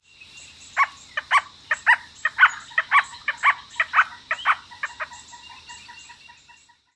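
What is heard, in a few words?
red-bellied woodpecker woika-woika interaction call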